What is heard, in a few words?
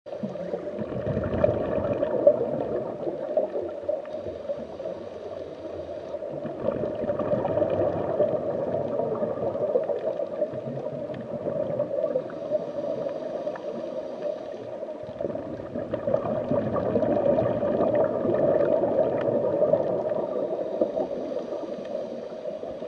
under water sounds while scuba diving